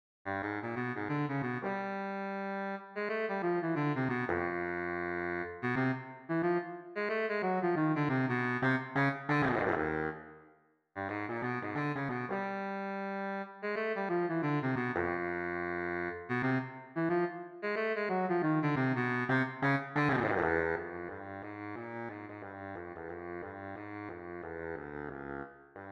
Baritone Saxophone

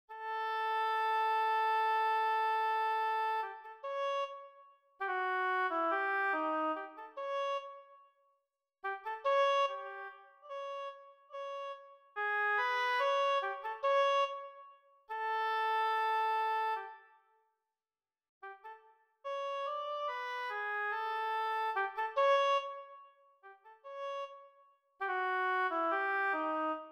Oboe

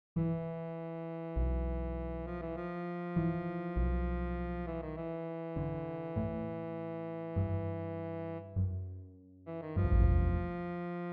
String Bass

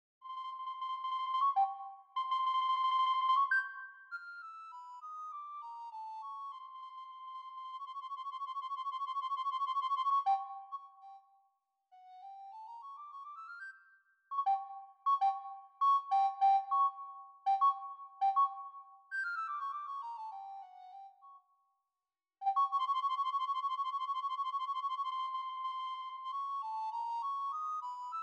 Recorder; Flugelhorn or Cornet Solo – Nostalgic

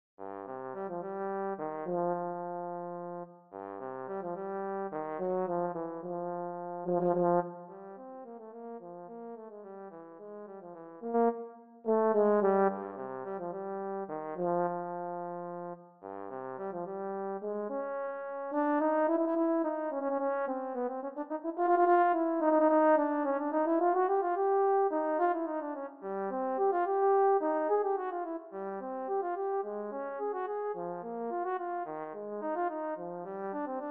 Euphonium

